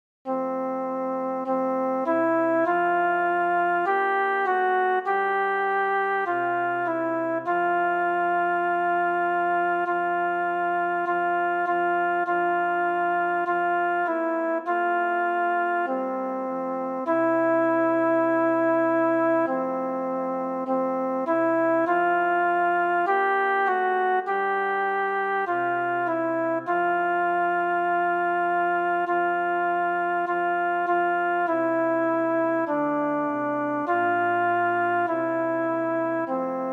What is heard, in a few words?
alto